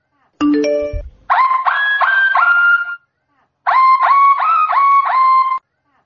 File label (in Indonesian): nada notifikasi WA rem truck; sulawesi